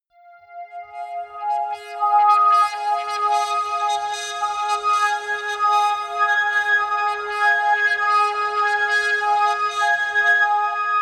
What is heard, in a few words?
atmospheric feedback pad high, drones; musikelemente